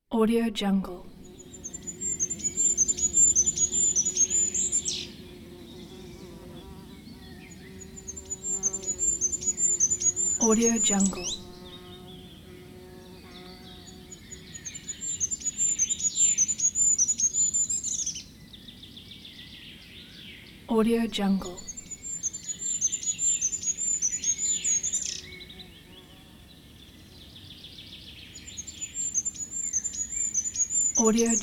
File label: nature sounds